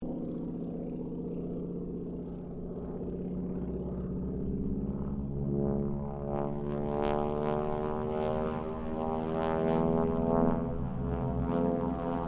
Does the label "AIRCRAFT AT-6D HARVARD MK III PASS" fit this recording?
Yes